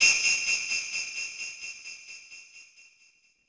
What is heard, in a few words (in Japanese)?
suzu; Higurashi no Naku Koro ni Matsuri; PS2; SFX suzu 1